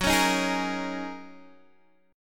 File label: F sharp 6th Add 9th, down, Guitar, Open G